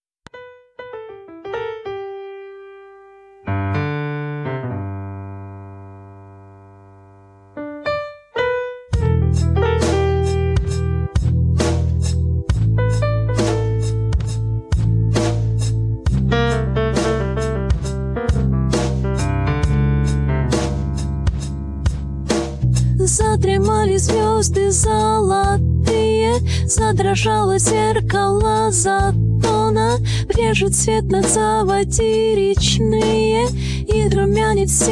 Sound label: Female vocalists; Esenin